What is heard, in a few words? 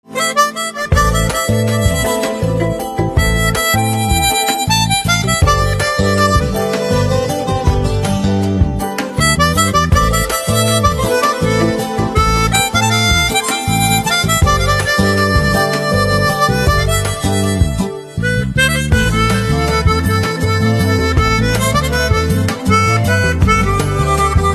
Harmonica